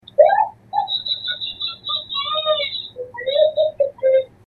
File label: Idiot laugh